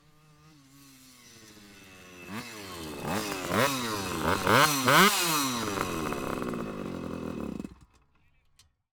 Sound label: Moped